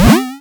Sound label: Jump